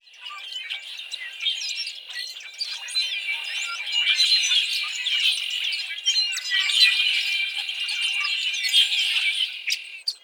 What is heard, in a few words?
Animals Red Wing Blackbirds